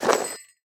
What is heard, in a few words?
armor light; smite; chiron